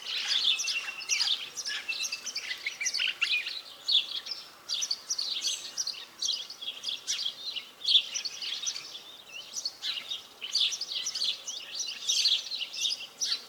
birds; Golden Crayon Sounds

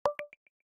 card alert; iOS 26 Sounds

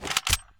hunting rifle draw; Original TK Sounds

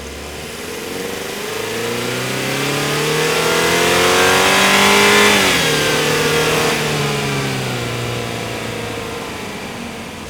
door move; Golden Crayon Sounds

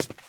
wood step; Golden Crayon Sounds

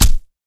body; Original TK Sounds

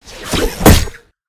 grappling hook hit; Golden Crayon Sounds

